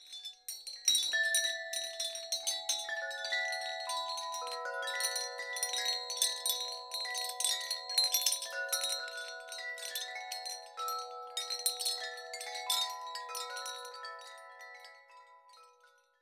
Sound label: wind chimes